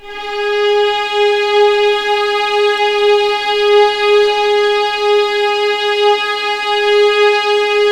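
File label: G#4 legato violins; East West; The Ultimate String Collection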